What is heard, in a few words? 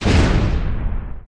Door Bust; Learn to Fly 2